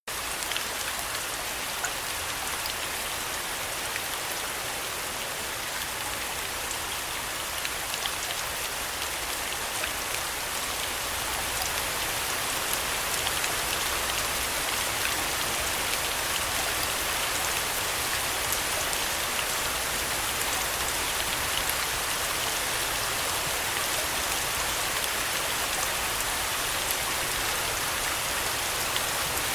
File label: Falling Rain Sounds louder